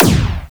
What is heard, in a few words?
gun; madcars2; radicalplay